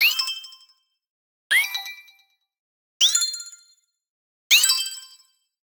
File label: Digital Click Positive